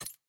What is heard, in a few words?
generic hover